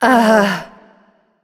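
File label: confused, female; smite; voicepack; hera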